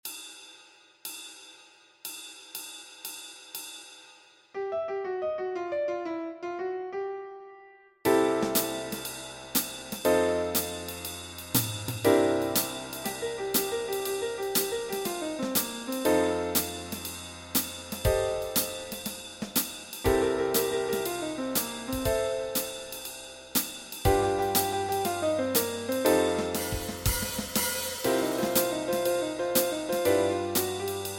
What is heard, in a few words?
Backing Track, walking bass